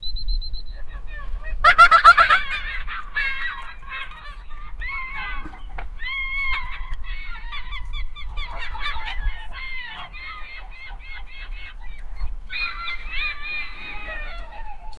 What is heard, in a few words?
flying eagle and ten year, childhood